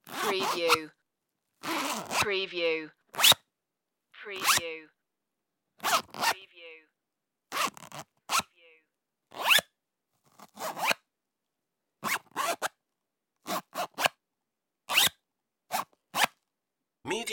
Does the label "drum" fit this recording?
No